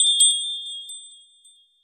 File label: Bell Shake; FREE SFX PACK VOL 2